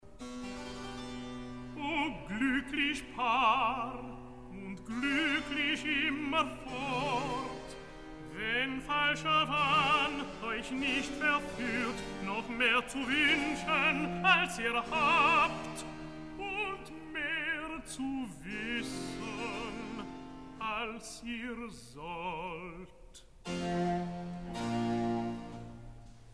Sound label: Recitativo; Creazione